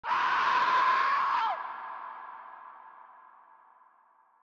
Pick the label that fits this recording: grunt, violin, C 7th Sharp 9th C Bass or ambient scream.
ambient scream